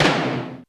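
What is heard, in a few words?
Tom Lo Thrash, Alesis D4 drum machine; 300 Drum Machines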